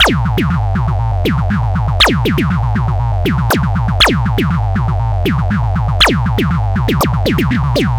Drums Strange

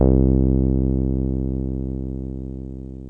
TB303 TRI, ROLAND TB-303 BASS

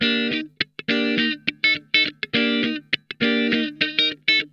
Funk Guitar; Zero G - Funk Guitar

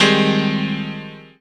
CHORDS; EastWest; Dance Mega Hip Hop Electro

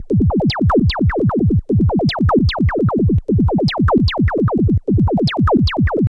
FLYING FILTER, 150; Masterbits - Tekkno Trax; SQ LOOP 150

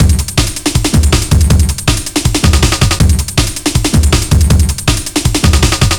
160, Drum Loops; Total Drum&Bass

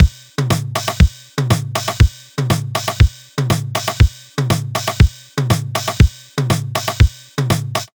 Loops, 707; classic machines; 707mod

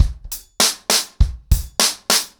100BPM, ACOUSTIC DRUMS

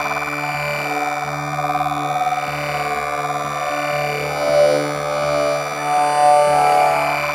The Stretch, Pads; Sonic Foundry (Sony Creative Software); Synchro Funk

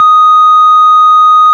ORG VIP Cl16'D#5, Farfisa Clar; Farfisa Clar DBar

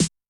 Dance, Yamaha DTXpress-II snare

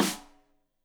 Rim Shot Stereo; Deeply Sampled Drums; SMDrum

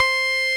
Roxichord C5, RMI E.Piano; Keyboards of The 60's and 70's

